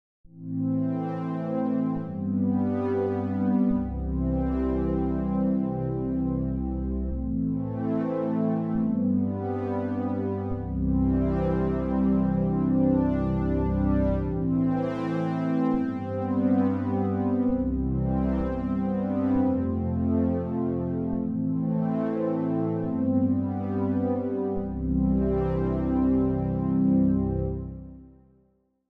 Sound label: Willow Pad